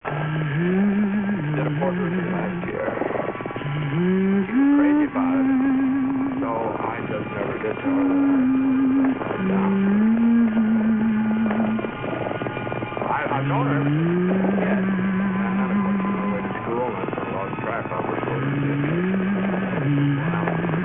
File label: hum tune; Pyewacket